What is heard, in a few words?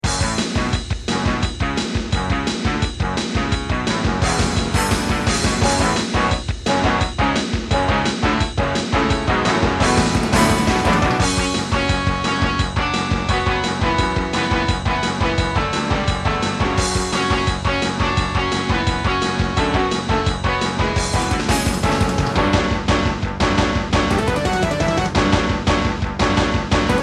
Intro, Diamond Monster Sound 2MB; Duke Nukem 3D